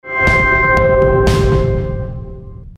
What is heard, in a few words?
level up; rotmg